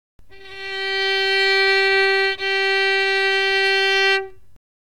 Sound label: Violin fingered